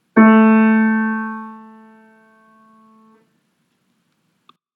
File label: alto chromatic note